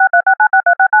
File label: win